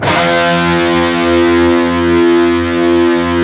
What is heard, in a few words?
PRS, Guitars, PWR SLID